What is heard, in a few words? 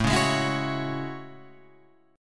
A Major 13th, down Guitar Open F; AM13 strummed